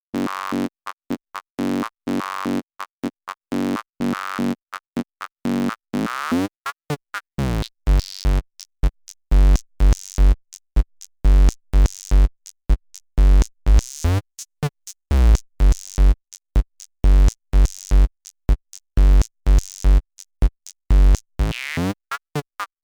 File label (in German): Doepfer Dark Energy III Rechteck HP FM Mod